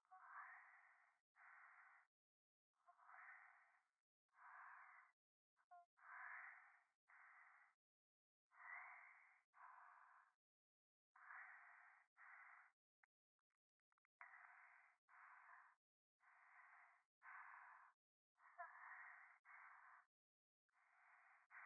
電話 右耳密着吐息

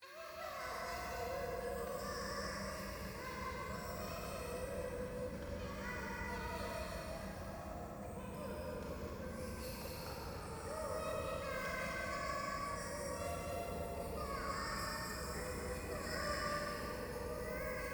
ゴースト 可愛い喘ぎ 電車

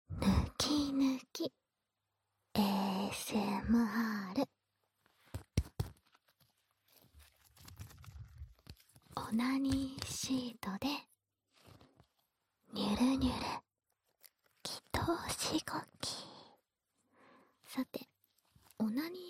オナニーシートでごりゅごりゅ♪亀頭責めASMR

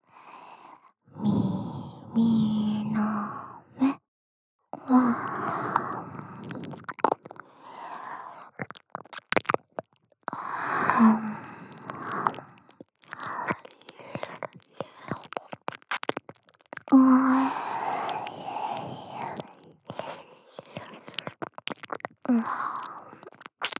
耳舐め

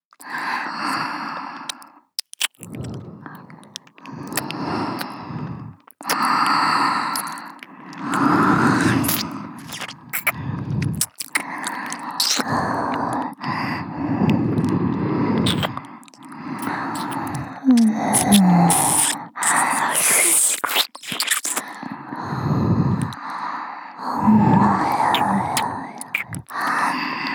両耳舐めループ, ASMR; SE有り; SPトラック

